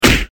Coup de poing

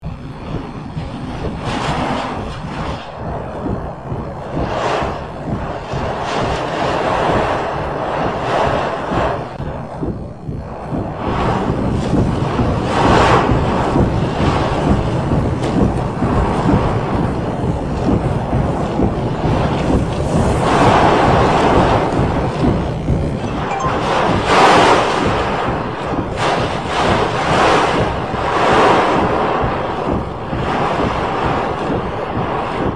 Ouragan dans voiture, Vents

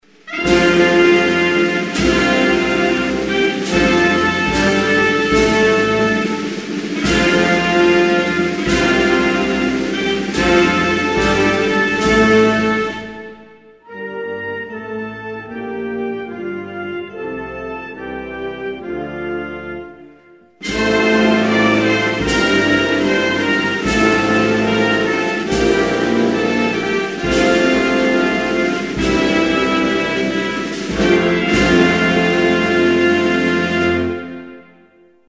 Jordanie, Hymnes nationaux

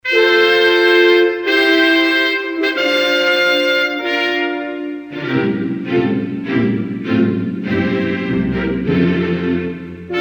Vatican, Hymnes nationaux

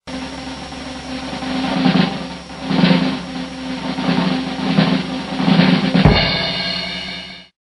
Roulement tambour; Cirque

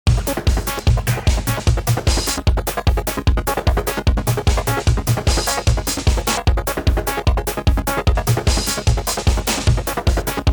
Agent secret jingle; Jingles medley

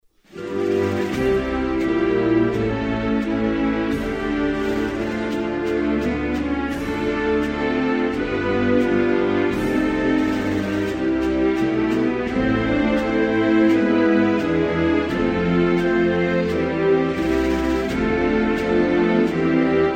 Jamaique, Hymnes nationaux

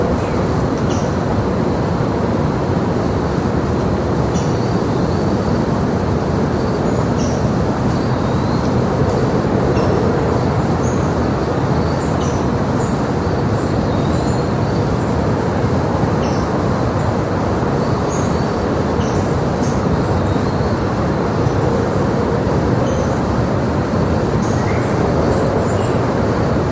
Bois en milieu urbain, Foret

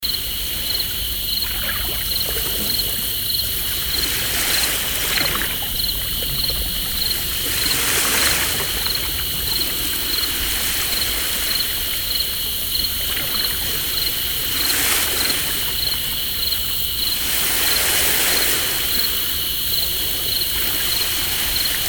Mer tres calme ete

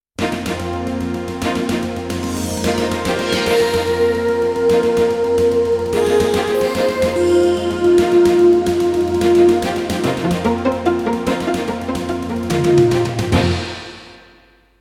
Flash Info jingle; Jingles medley

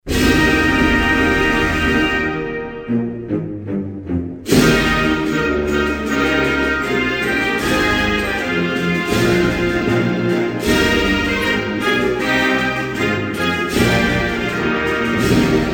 Afghanistan, Hymnes nationaux

